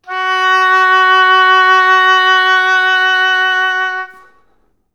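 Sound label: vibrato F#3; Best Service; Peter Siedlaczek's Advanced Orchestra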